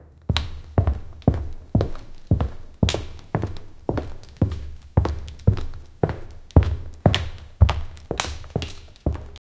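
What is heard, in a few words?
cinematic footsteps medium, concrete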